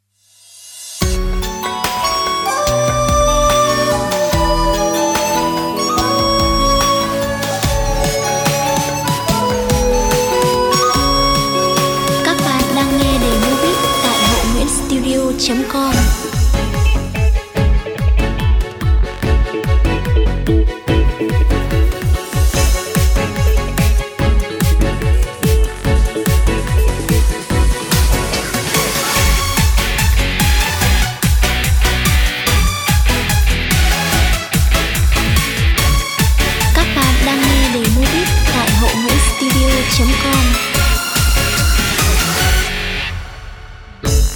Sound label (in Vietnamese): beat